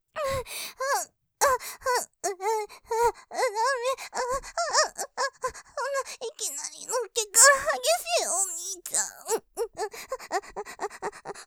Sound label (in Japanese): 喘ぎ のっけから激しい; ループで楽しむ！シェフの気まぐれ抜き抜きコース